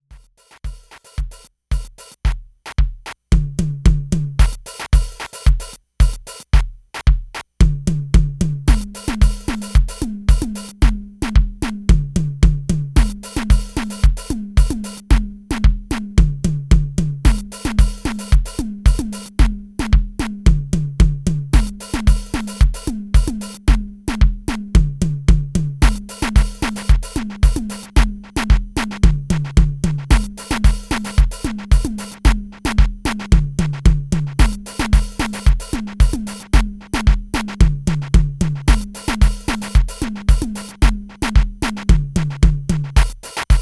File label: overdubbed drums a piano and a synth